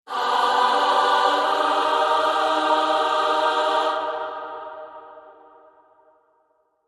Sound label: G, Choir Only